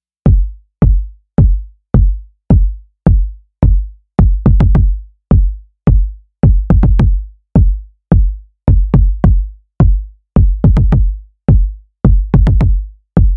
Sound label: MOOG MAVIS KICK Dry Signal